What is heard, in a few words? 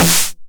909 SD Tape Sat